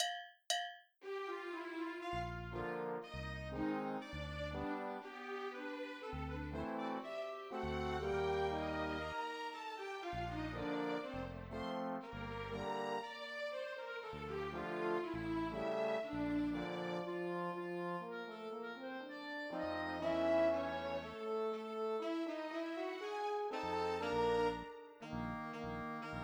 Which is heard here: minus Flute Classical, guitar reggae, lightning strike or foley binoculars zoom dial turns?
minus Flute Classical